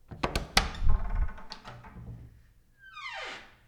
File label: Door Open